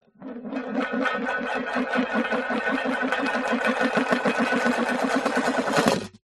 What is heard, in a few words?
metal money tin lid spin on table; zapsplat